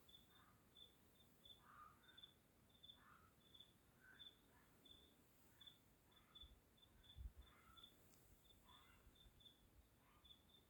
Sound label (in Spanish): zorro gris